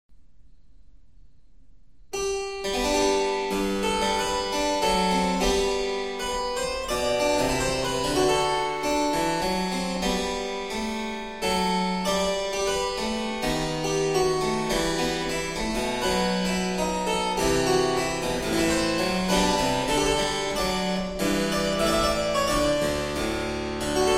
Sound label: suite in g major